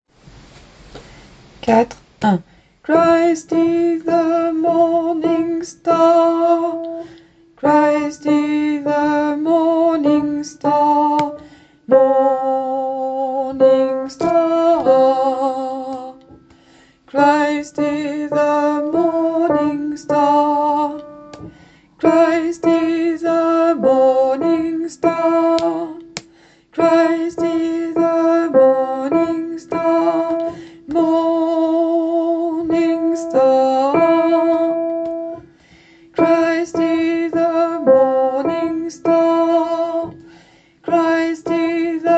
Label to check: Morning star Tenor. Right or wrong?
Right